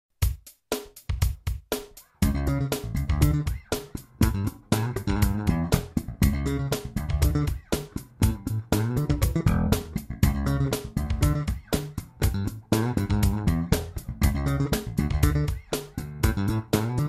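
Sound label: Just Doublethumb in E, bass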